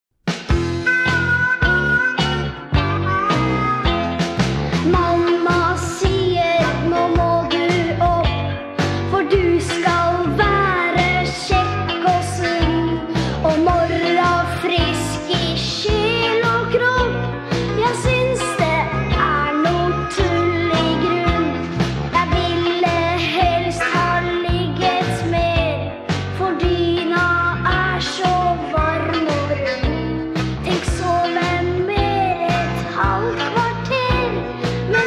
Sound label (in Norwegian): Morratrøtt Blues